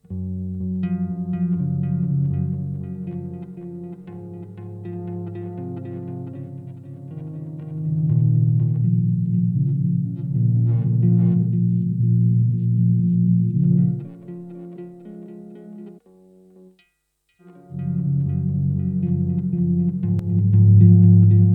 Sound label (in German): Golden Master Bass EQ Comp u Stereo Settings; Endorphines